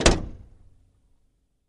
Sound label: Camaro Door Close Hea, chevy camaro